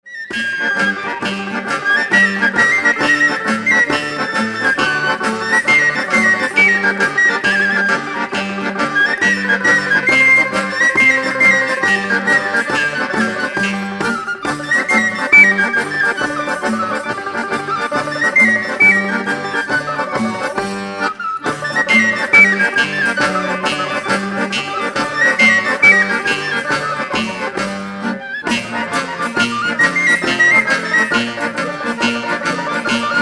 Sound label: Contradanza (Sicilia)